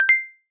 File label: Pairing Successful, AirPods Sounds Case